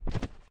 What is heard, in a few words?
Jump Hit Ground; Lethal Company; Windows